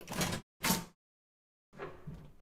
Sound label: exit door open